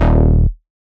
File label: Bass Shot D#